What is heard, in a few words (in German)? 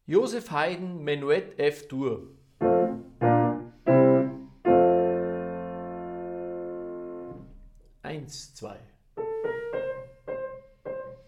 Menuett F Dur, Klavier; Haydn